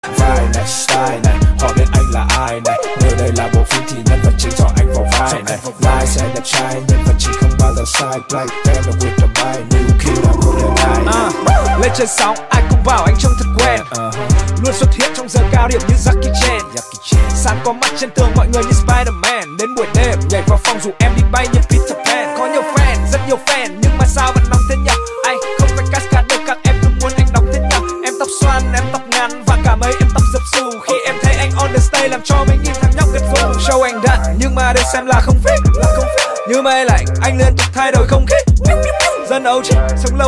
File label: rap